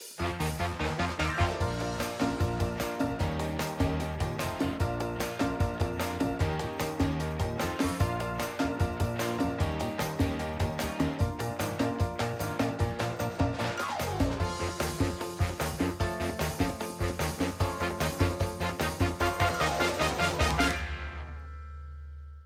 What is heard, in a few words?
Happy & Sappy Instrumental